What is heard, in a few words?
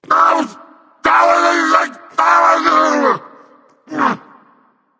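nonverbal grumble; smite; voicepack; merlin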